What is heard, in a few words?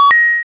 key